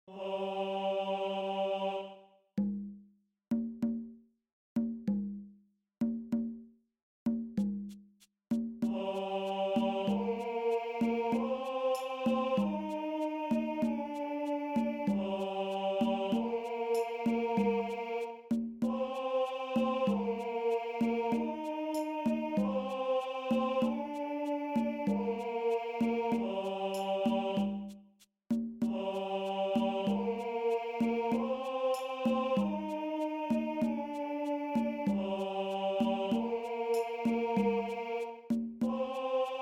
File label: TENOR, choir